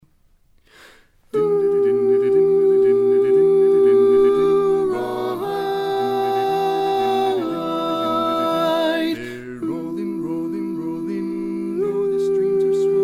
TENOR; learning tracks

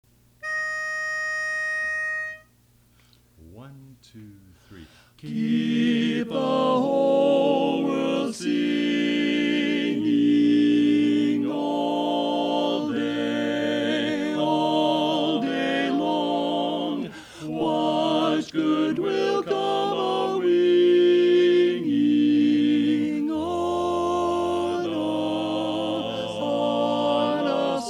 Tenor; learning tracks

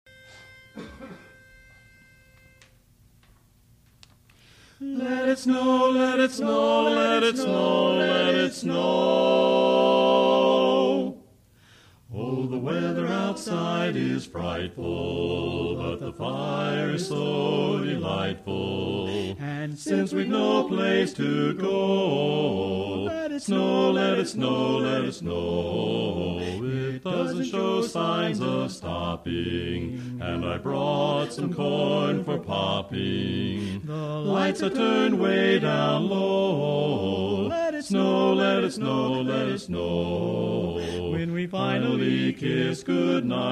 Bass; Christmas; learning tracks

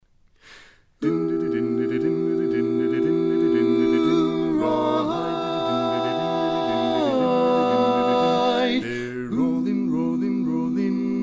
Rawhide LEAD; learning tracks

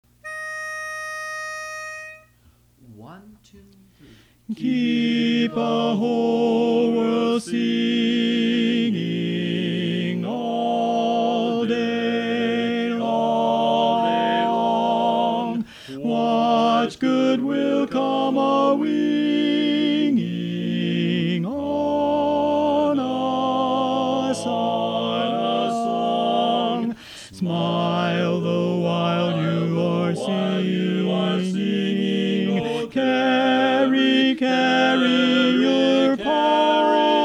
Lead; learning tracks

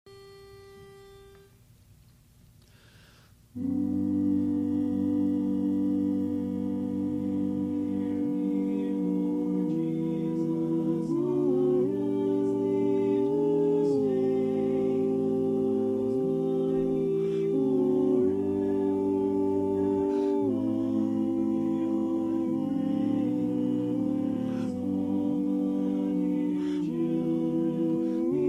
Cradle Song Tenor; Christmas; learning tracks

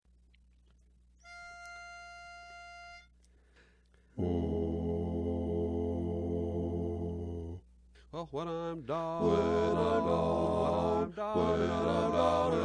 When I Lift Bass; learning tracks

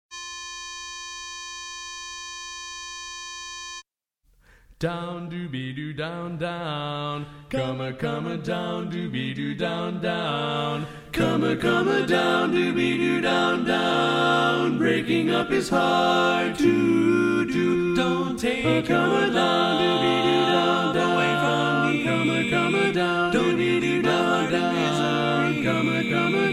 Tenor, learning tracks

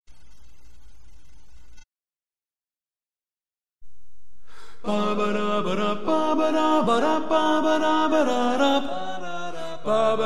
Baritone Predominant; Muppet Show

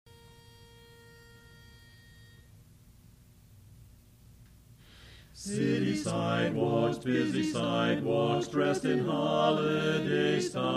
Tenor; Christmas; learning tracks

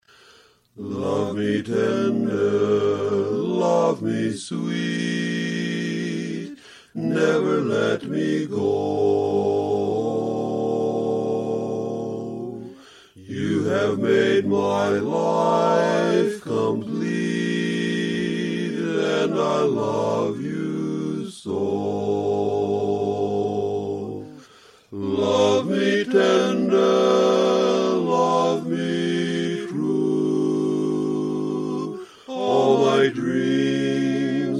Bass Chorus Only; learning tracks

